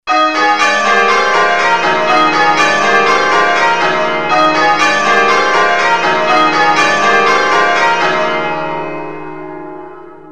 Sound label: Tittums, changeringing